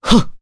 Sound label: Jump, jp; Pavel; kingsraid; voices heroes